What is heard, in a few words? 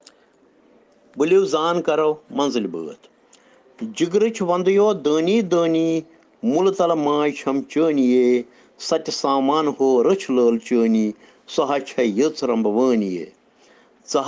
Children Rhyms in Kashmiri